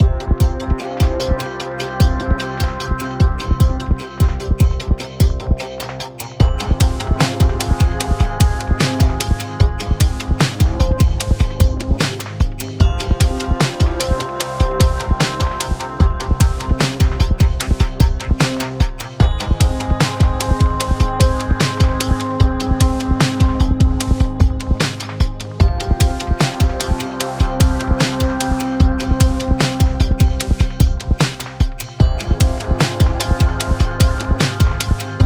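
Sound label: Music Industrial Post Race 75bpm; Forza Motorsport 6 Apex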